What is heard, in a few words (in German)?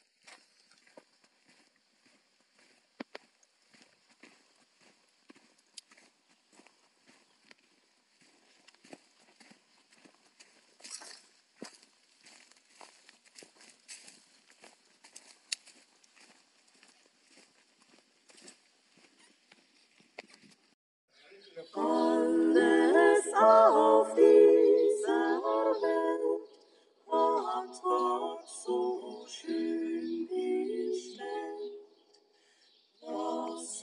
Kleine Soundkulisse Spaziergang Eichelberg